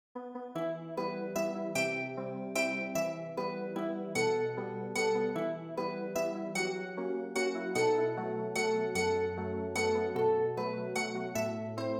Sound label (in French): ORCHESTRE; Il est bon de louer